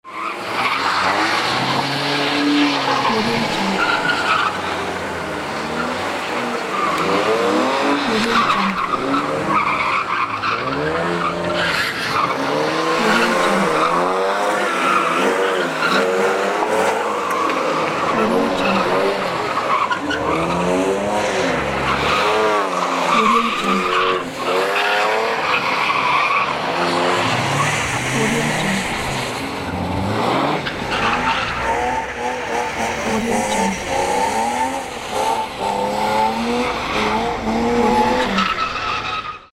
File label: car racing